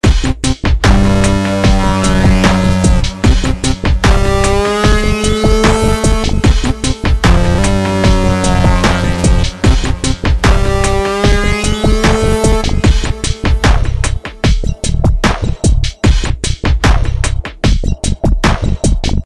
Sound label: dubstep short; bgm; nickelodeon